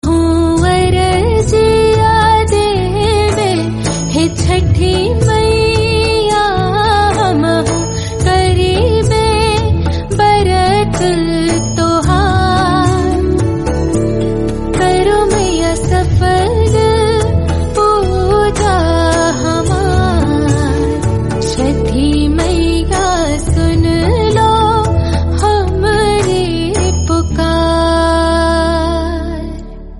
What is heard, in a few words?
rajasthani devotional song; chhat puja